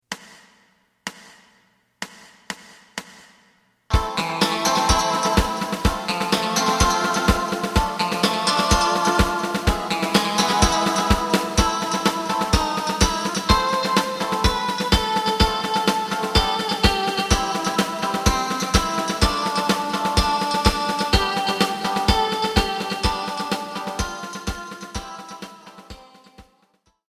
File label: bass